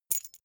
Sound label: key ring small catch